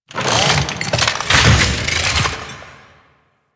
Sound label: Necramech Ranged Get Up; Enemies; Warframe